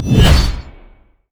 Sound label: crit attack; LoL; tryndamere